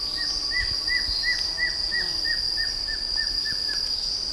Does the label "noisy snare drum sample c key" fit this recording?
No